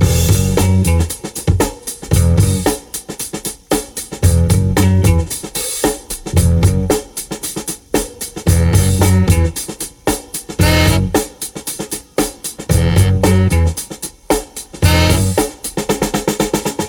114 bpm fresh drum groove e key

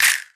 sharp clap sample G# key; percussion; single hits one shots

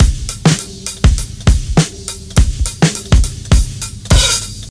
102 bpm breakbeat sample B key; drum loops

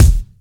short kick drum sound e key; kick drum samples

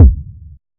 reverb steel kick drum sound d key

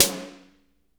crispy snare sound C sharp key; Mono

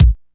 studio kickdrum sound a key; kick drum samples

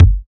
boomy kickdrum sample g key

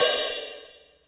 big room open hi hat one shot g key

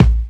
deep funk bass drum single hit a sharp key

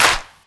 clap sound clip c key; percussion; single hits one shots

